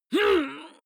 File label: male fight pain groan short; vocals; comedy-cartoon; soundpool